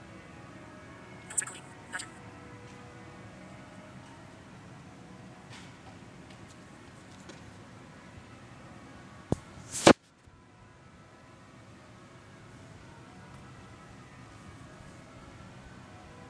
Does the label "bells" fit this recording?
Yes